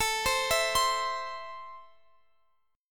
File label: A Minor, Guitar, Drop D